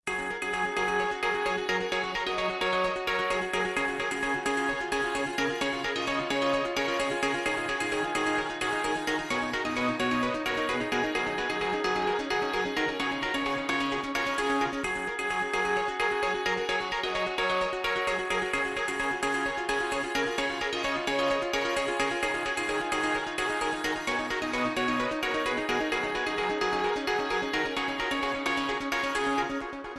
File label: Synth